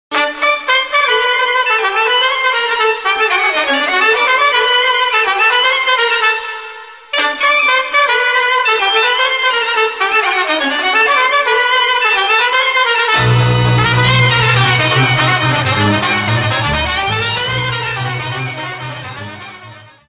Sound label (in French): GEAMPARA DUNAREANA, Roumanie